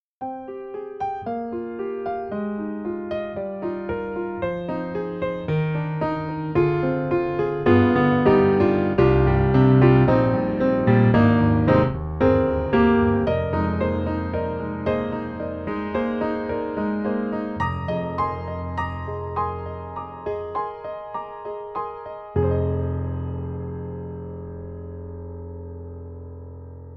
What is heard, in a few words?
Grand Piano Model D; UVI